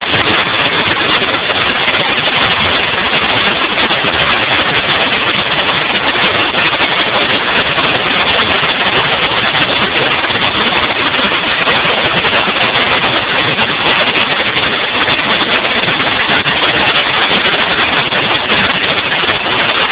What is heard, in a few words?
noise